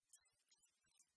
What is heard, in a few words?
walk back